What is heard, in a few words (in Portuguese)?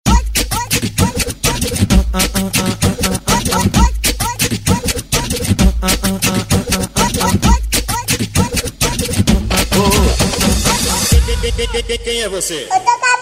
funk; tribo do funk